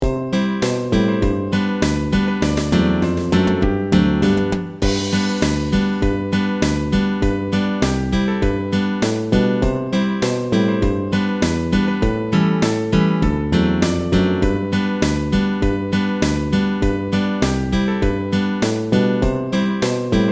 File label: jingle bells instr